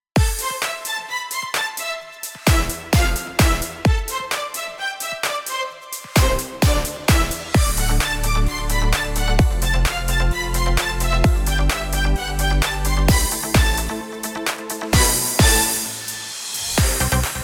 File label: edm